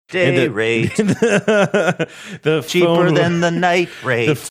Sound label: singing day rate cheaper than night rate